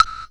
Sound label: BLOCK; Crypton; DrumBase5000